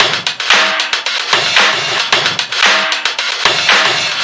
113 nothing but crunchy highs, drum loops and breaks